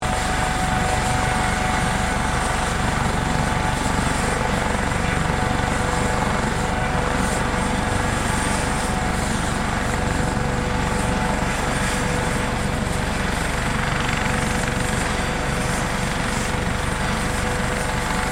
Helicopter Off, Aircraft, Helicopter, Shut, Off, Hh-65, Dolphin; Transportation